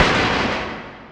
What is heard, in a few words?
asteroid damage metallic big; Factorio